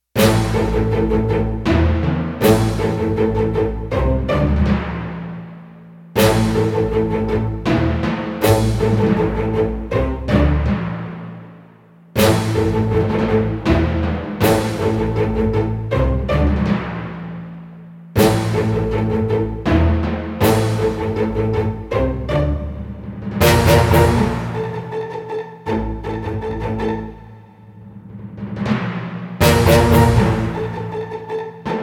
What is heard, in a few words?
TENSION